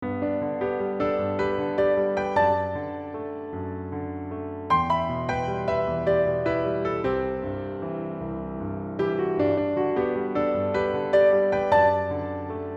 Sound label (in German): synkopen; praxis spieltechnik